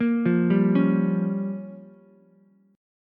Bb Minor 6th, Ukulele; arpeggiated; JGuitar

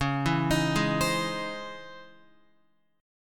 C sharp Minor Major 9th, Guitar; C#mM9; arpeggiated; JGuitar

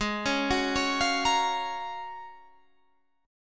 Gb Major 7th Ab, guitar; Gbmaj7/Ab arpeggiated; JGuitar

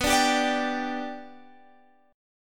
B Minor Sharp 5th, Guitar, Half Step Down

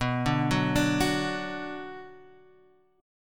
B Minor B Guitar Standard; arpeggiated; JGuitar

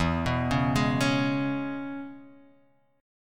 E 6th, down

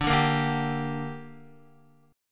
D Suspended 4th Sharp 5th D down Guitar, chord; strummed; Dsus4#5 chord

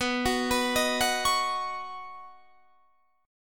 B Major Add 9th, B, down, Guitar Standard; Badd9; arpeggiated; JGuitar

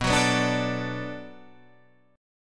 D 6th B, chord

